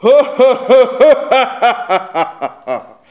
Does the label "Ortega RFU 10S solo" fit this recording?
No